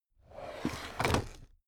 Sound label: drawer cabinet stuff open regular